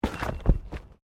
Body movement Impact Roll Road; Body movement foley to simulate falls and deaths; Rolling

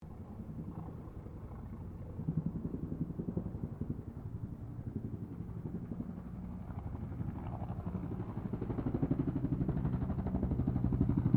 HELICOPTER 1967 BELL UH1 IROQUIOS PASS; RAN UH-1 Iroguios; Helicopters (Sound Effects:Vehicles)

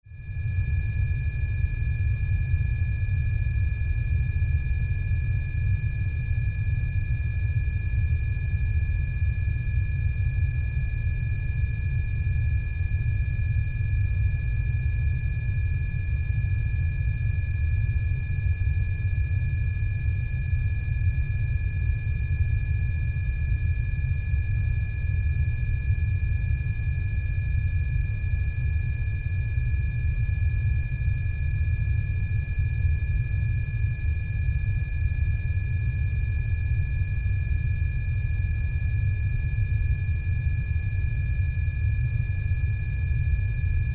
Sci Fi Spaceship; Sci Fi Spaceship Cockpit High Pitch Fans Rumble; Spacecrafts

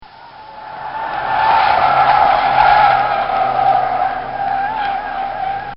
A Volvo Skidding; Cars; Vehicles

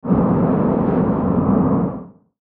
Wind Short; Rush; Blastwave

